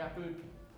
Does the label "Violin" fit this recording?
Yes